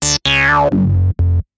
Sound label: notify